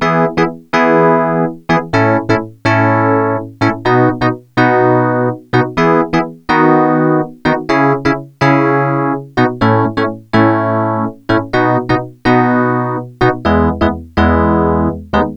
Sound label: dx7ii